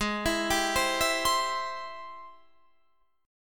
Ab Augmented Major 7th Ab Guitar Drop D

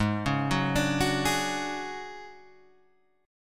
D Major 7th Flat 5th Ab, chord

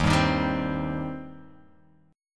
D sharp 6th, D sharp, down

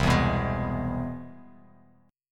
C-Augmented Major 7th-C-down-Bass Standard; strummed; JGuitar

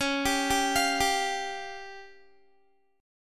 Db Major Flat 5th Db down Guitar; arpeggiated

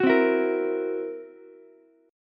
Fsharp 7th Flat 5th down Ukulele; strummed; JGuitar

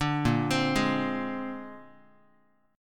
Bb 9th, Guitar; 4A,4E,5A,5D,5G#,6C#; arpeggiated

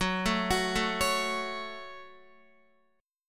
Gb Minor Guitar 4F,4A,5C,5G,5C,6E; arpeggiated; JGuitar

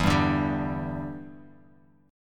E Minor 7th, down

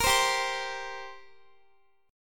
G Minor Double Flat 5th, G, Guitar; Gmbb5; strummed

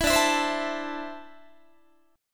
D 7th Suspended 2nd down Guitar; D7sus2 strummed

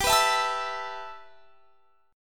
G 6th Add 9th; G6add9 strummed; x,22,21,21,22,24

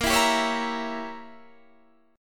A sharp Diminished 7th Guitar Standard; 18,19,17,18,17,18; strummed; JGuitar

